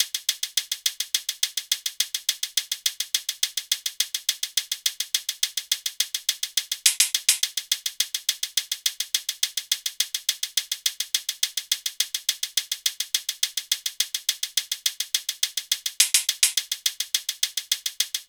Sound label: hat 105, Hat Loops; Slate; SD MIDNIGHT Sample Pack; Omni